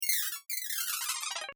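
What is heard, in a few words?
Panning, sonification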